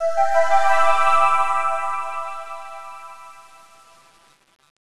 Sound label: spawn; MBHaxe